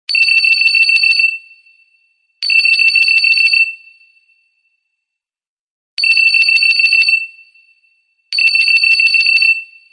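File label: Nokia X8 Fresh, dzwonki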